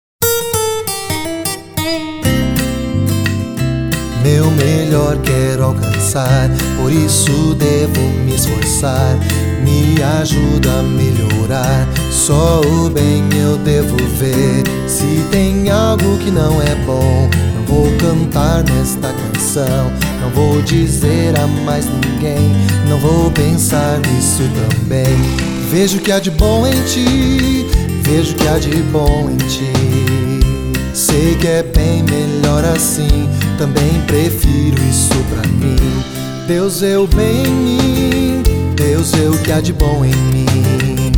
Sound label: Song, PT